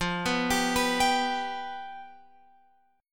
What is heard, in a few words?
F Suspended 2nd Flat 5th, Guitar, Open F; arpeggiated; x,12,11,14,14,14; JGuitar